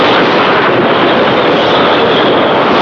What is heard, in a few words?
wind; TotalImmersionRacing; XBOX